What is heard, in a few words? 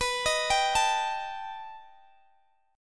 B Augmented 7th B down Guitar Open D